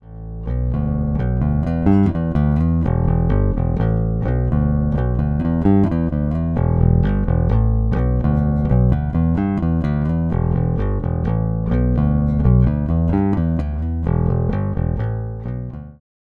Vordere Spule Plektrum; Harley Benton MB 4 SBK Deluxe Series

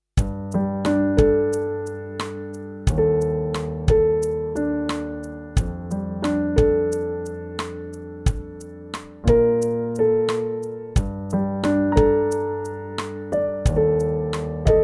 BOSS RC 505 MKII Soundbeispiel

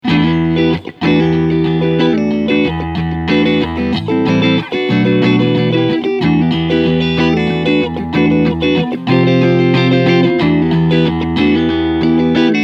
Clean beide SC Modus

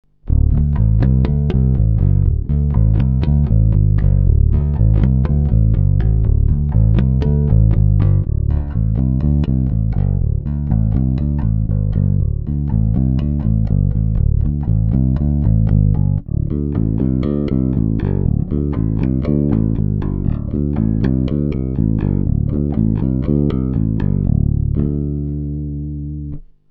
StarBass Finger Hals Beide Bridge

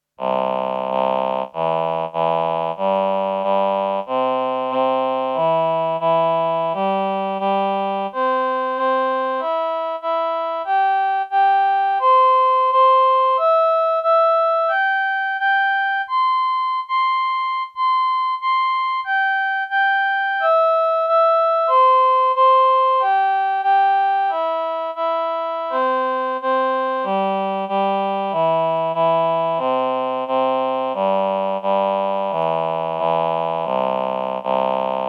VP330 CS340 Chor roh

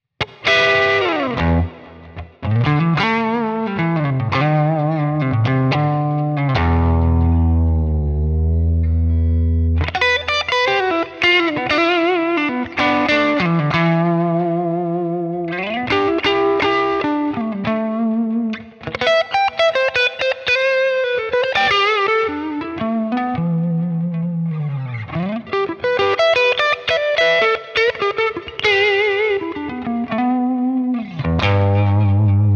Gitarren Plug-ins im Vergleich S-Gear Breakup

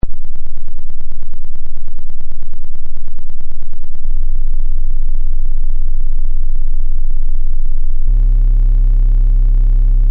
Pulse 2 Osc 1 Dreieck

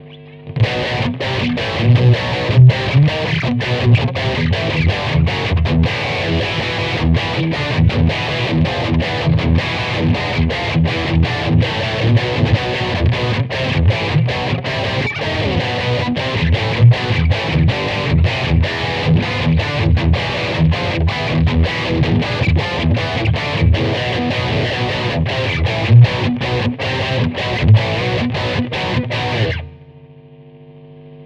High gain ohne switch; Bukka

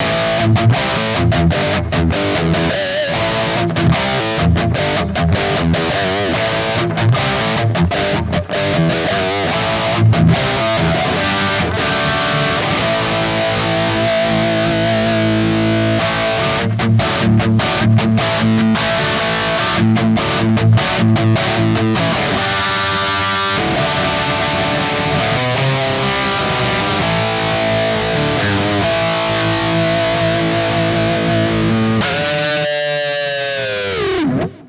Floorboards im Vergleich Highgain Pure Amp Kemper

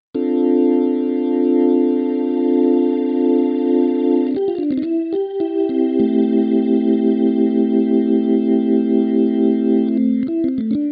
Korg Grandstage Orgel Single Drawbar

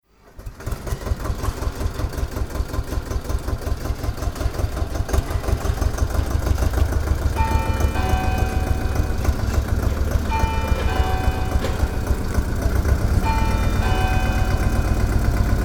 Marktplatz Kirchturm Traktor Atmo

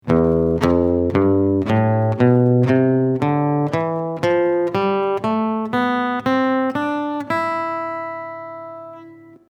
e phrygisch

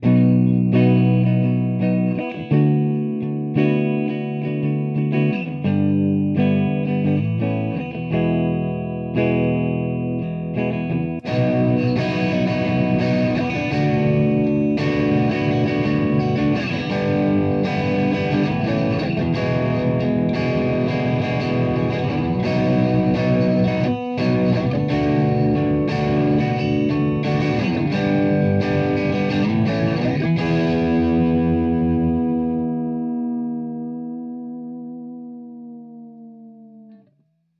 Verzerrer Pedale Neutral; Revv G3

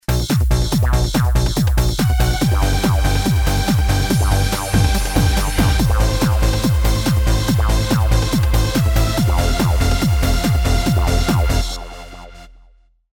Billig Trance

